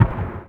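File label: mg42 distant; WAR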